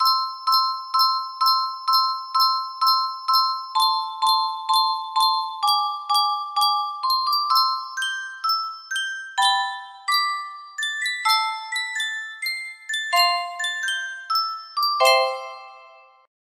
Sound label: Yunsheng music box, music box melody; animal crossing new leaf pm500